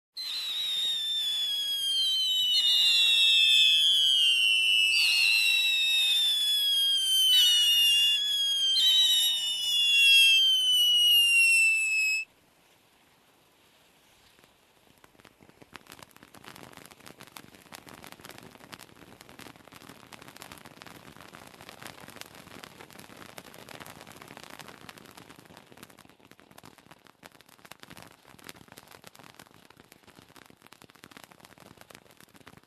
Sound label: Firecrackers with whistle